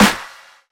clap sample g sharp key, Loudest frequency: 2035Hz; single hits one shots